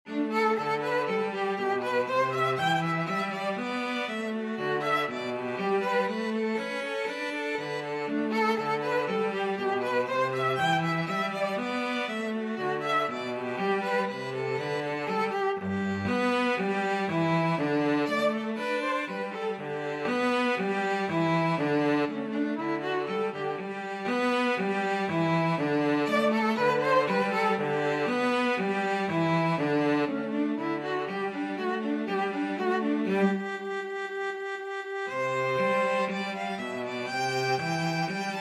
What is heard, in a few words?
violin cello duet